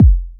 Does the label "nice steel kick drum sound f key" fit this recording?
Yes